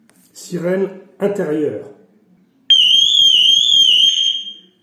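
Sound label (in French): sirène intérieure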